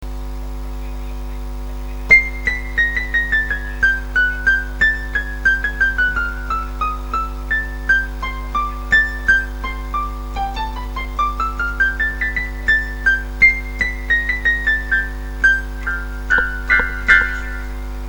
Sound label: piano